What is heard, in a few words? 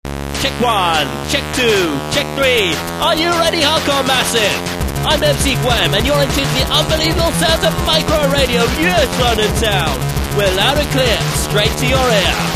MICROJINGLE; GWEM